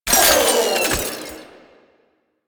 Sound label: Fulmin Prime Low Clip Mech, Weapons; Warframe